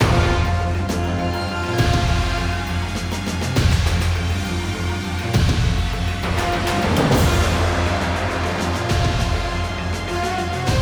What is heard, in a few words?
Rebellion Outside Fight; Far Cry